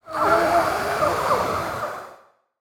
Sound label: Zombie Throat, Monster Sounds; TheDeclineOfWarriors; Monster Sounds Volume II